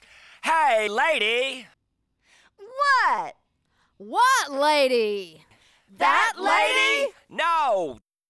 Vocals

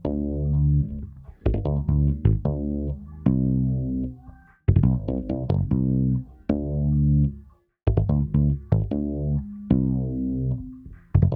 Chapman Other Loop

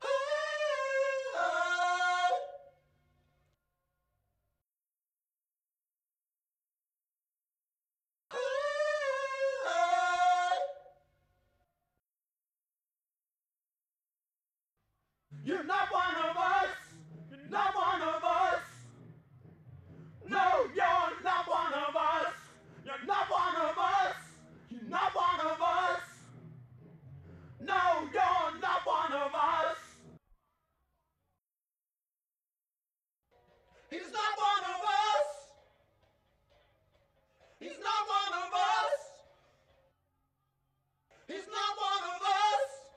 Vocal Backing Vocals

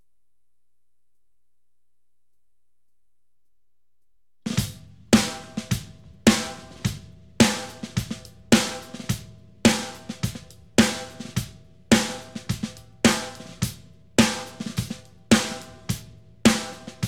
drums

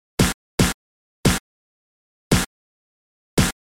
Snare chorus; Multitrack

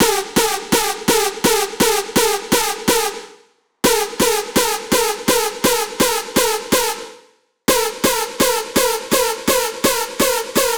up Noise

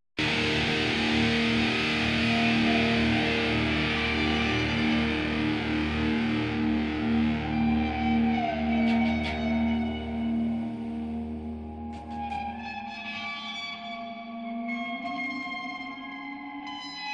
guitar

